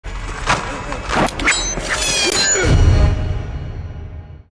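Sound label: Monster kills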